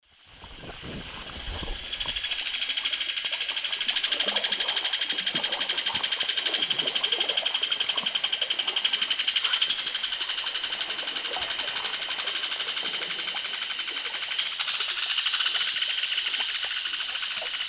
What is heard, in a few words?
Megaceryle torquata, calls pair; S. America; Eastern Lowlands